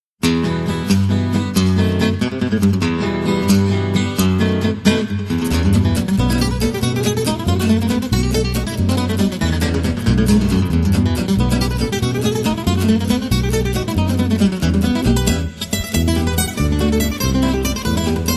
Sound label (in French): le jazz manouche